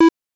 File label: single Beep